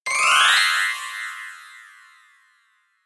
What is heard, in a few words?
Bell UP